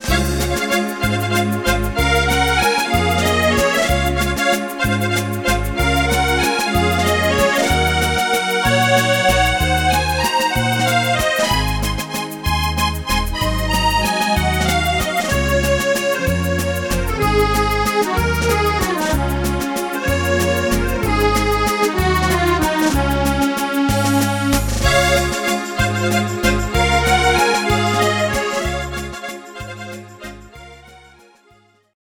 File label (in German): Musette, OTP mit VSP-Style; VSP-2; 450CT; VIVA MUSICA Fachhandel